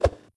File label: throw